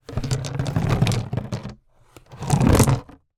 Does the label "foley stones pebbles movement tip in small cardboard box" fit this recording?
Yes